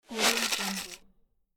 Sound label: paper whoosh